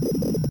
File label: grind